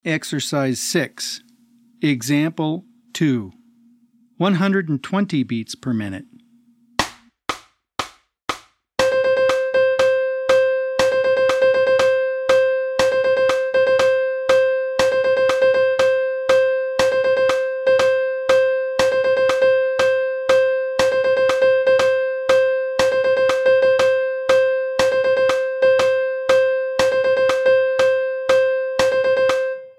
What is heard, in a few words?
120 BPM